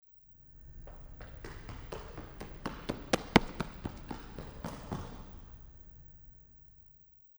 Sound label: Footfalls Run by in Room; footsteps